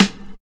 dark sounding snare sample g key, Royality free acoustic snare sample tuned to the G note; snare drum samples